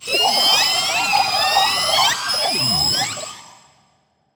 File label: BABY MOLOCH alarm